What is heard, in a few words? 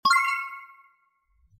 success